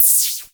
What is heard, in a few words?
KLONE NOIZ, Klone Dual-Percussion-Synthesiser; KLONE NOIZ NW8